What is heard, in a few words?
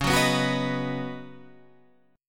C sharp 9th Suspended 4th, down, Guitar Standard; strummed; chord